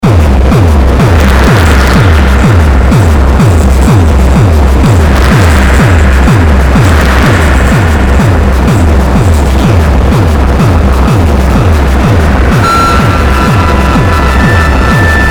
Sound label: noise tape